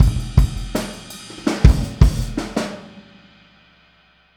Boogaloo - Outro - Kick, 82 BPM; Sonic Foundry; Drums from the Big Room The Mixes

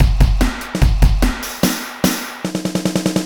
Groovy Drums, Drumloops; Funk; Sonic Foundry; Dr. Fink's Funk Factory

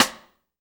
sidesticks

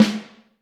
Snare Drum, 5X14; Q Up Arts - Johnny.C's Vintage Snare Drum

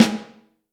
snare drum; Vintage; 5X14; Q Up Arts; Johnny.C's Vintage Snare Drum

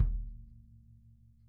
kick

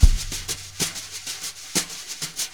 BRUSH LOOPS

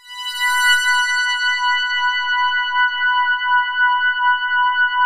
5TH HARD H4; SPACIOUS; Music Store - Claudius Diemeter Schwerlos; SPACIOUS 1

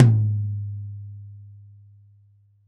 RAW TOM, Drums Acoustic; ALL TOMS; USB Soundscan vol.10; AKAI; 90s sample CD

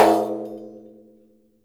DAIRES OPEN, Mediteranean Traditions percs

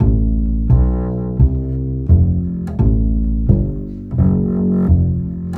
Acoustic Bass; Sonic Foundry; Leo Cavallo Bass Taster

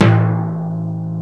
Timp Drum A1; Proteus 2000; Multisamples; Digital Sound Factory Proteus 2000

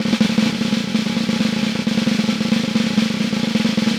Snare Drum, 5X14; Vintage Snare Drum; Q Up Arts - Johnny.C's Vintage Snare Drum

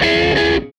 Tele Guitar Loop; Sonic Foundry; Six-String Orchestra

